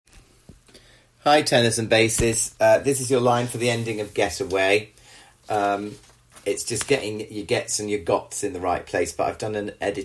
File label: MEN Get Away Ending